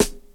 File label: focused acoustic snare g sharp key; snare drum samples